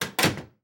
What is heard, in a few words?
Hospital Door Close; Medical; Blastwave